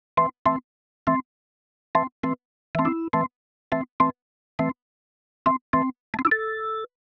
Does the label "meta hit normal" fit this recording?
No